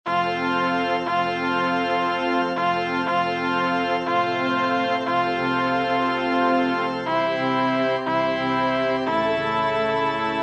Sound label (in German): Zum Eingang, organ; Schubert